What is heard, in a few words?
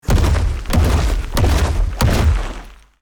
T Rex dinosaur movement fast